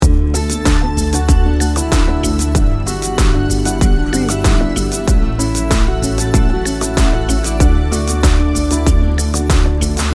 heartland americana loop

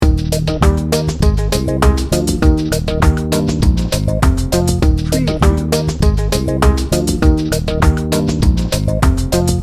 tropical sunshine loop

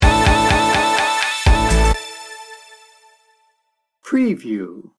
happy carefree indie pop sting bumper

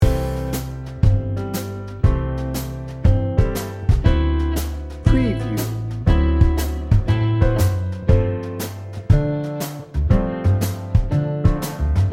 big band swing loop

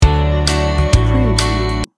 retro country feel good loop

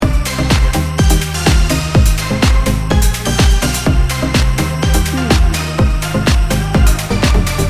dance party edm loop

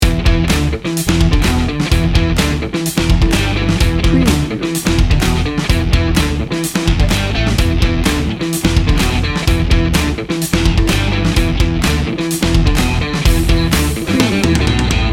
bouncy bad boy loop

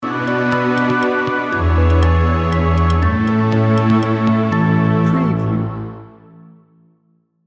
scenic wonder sting bumper

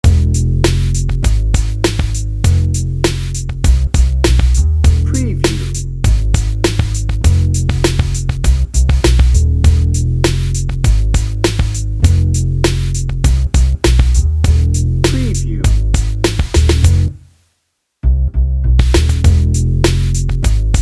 sneaky suspicion dnb; Light and mysterious, featuring acoustic piano, horns and baritone sax. Creates a mood of light suspense.; Comedy, Suspense, Dramedy, Cartoon, Children

